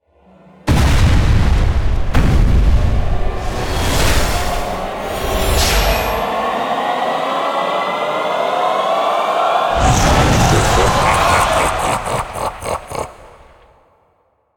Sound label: music boss intro; CRYSTAL LEVEL; OLD ONE